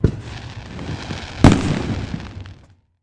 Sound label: Skyrocket; Conquer Online 2.0